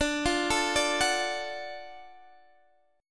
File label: Gb Minor Major 7th Sharp 5th, chord